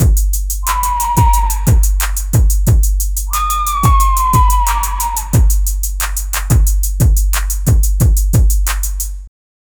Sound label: loud club music late